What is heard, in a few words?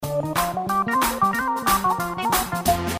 Rabiz